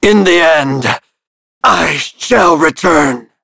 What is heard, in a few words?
Vox Dead; Shakmeh; kingsraid